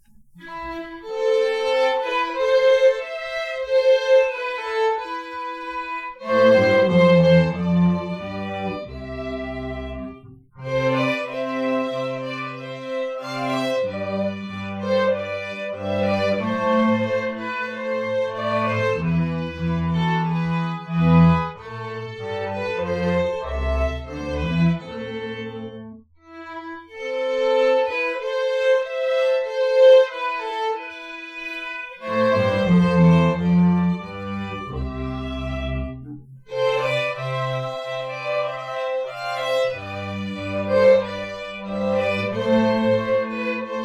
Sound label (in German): streicher maria durch ein dornwald ging